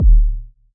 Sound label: Voyager Kick, Moog Voyager